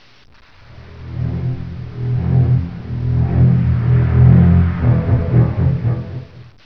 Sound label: jaws